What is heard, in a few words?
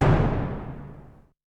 Hit; ZERO G - Datafile DISC 1